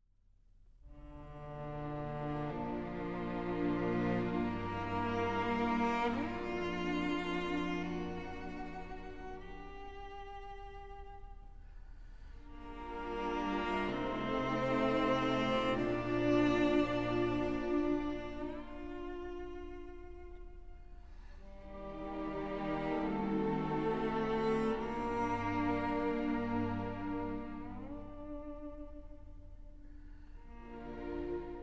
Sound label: Cello Concerto; Elgar